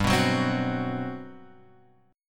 G Major 11th; GM11 Chord {3 2 4 5 X 2} strummed

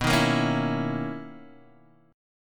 B Major 11th B, guitar; BM11 Chord {X 2 1 3 2 0} strummed

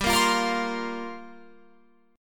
G Minor 11th G down Guitar Open F; Gm11 strummed

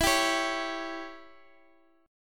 E Minor 6th E, Guitar Open D

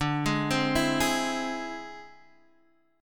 E Augmented 7th D

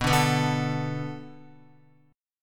B Minor 7th B Guitar, chord; Bm7; strummed; x,2,2,4,2,5; JGuitar

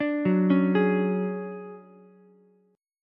D Major Sharp 11th, D, down, Ukulele C6; arpeggiated

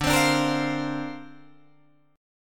D-Minor 7th Sharp 5th, D, Guitar, Drop D

